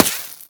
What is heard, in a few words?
Laser Impact Generic; Warframe